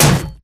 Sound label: bullet heavyballistic titan; Titanfall 2